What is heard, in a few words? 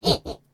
Banshee Attack Vocals